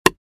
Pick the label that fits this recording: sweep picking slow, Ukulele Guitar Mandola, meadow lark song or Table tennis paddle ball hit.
Table tennis paddle ball hit